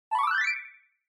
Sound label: Scene Change Fast